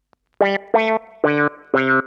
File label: SYNTH 90 A; old rec